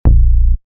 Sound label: Bass Samples Techno House